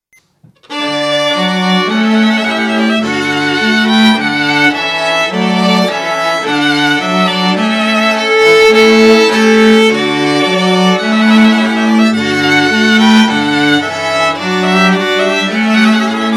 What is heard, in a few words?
Trumpet Voluntary